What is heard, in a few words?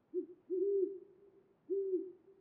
owl; ambient